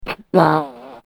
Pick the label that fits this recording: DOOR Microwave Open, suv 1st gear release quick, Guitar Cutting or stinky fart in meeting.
stinky fart in meeting